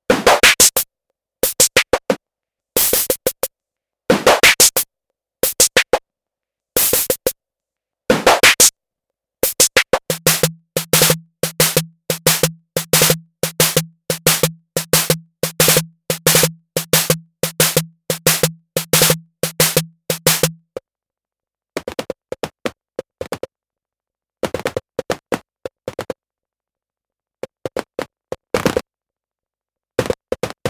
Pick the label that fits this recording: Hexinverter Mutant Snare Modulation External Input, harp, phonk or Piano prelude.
Hexinverter Mutant Snare Modulation External Input